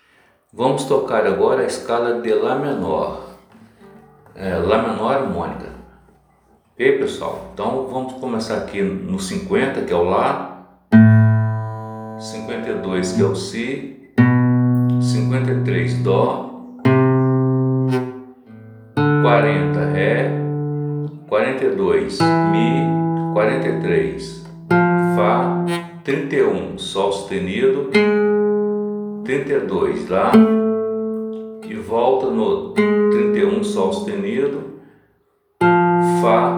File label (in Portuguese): Escala lá menor